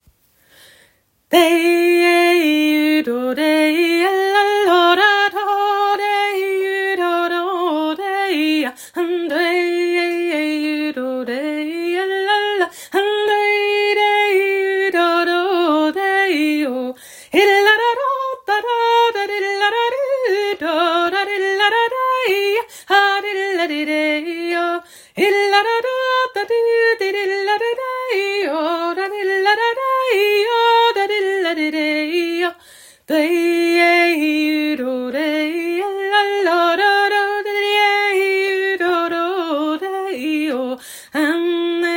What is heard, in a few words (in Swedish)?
polska; efter petter dufva